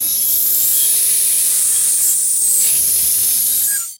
drel zubnaya pronzitelnyiy voy

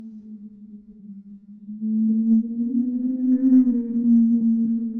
veter zavyivanie

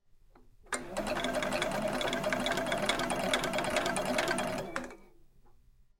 babushka sht tihiy uyut, shveynaya mashina

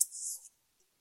molnienosnyiy vyihod osvobojdenie

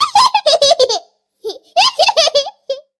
ozornoe pohihikivanie malyisha

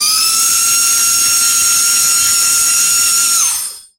drel v filme dramaticheskiy effekt

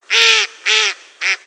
smeshlivoe kryakane utki

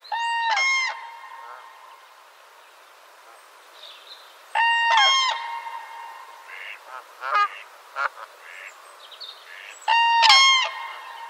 odinochnyiy bugl na rassvete, kriki juravley

